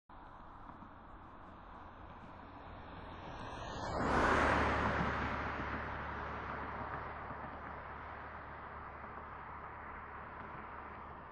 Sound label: passing; WMBonusXP